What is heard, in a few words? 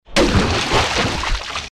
splash